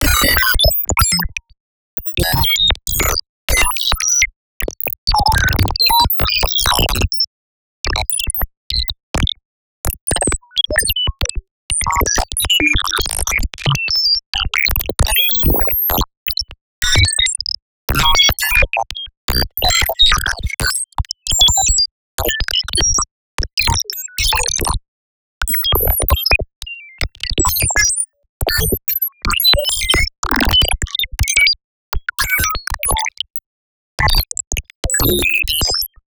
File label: basic glitch; base pack